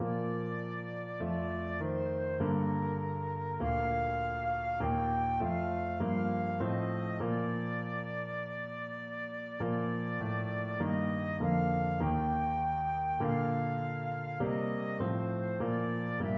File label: abide with me flute